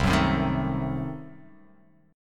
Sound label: C Diminished 7th down